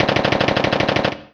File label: Kawai K5000W Machine Gun